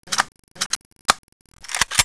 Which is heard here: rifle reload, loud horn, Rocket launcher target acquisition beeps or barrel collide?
rifle reload